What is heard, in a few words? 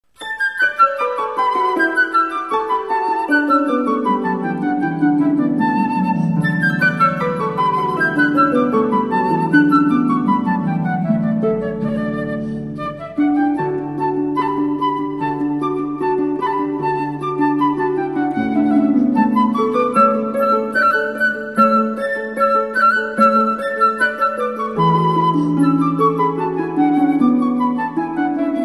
rondeau, harp